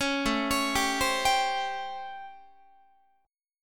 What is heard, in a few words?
A 7th Sharp 9th, Guitar; A7#9; arpeggiated